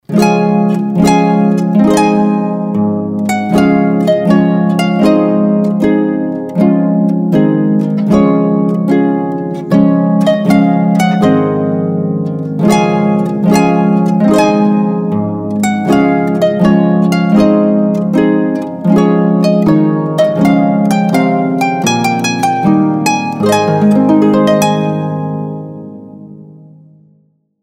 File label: Harpist